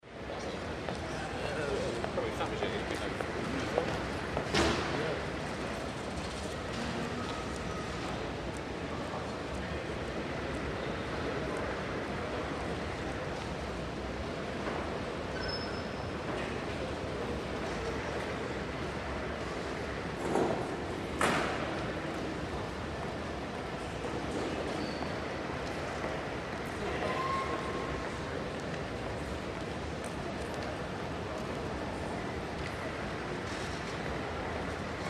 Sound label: Airport lobby, not busy increasing to busy; British Airways Terminal, J.F.K.